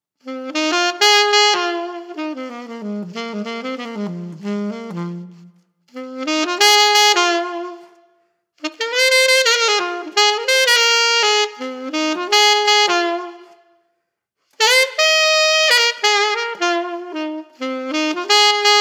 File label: Alto; Super Nova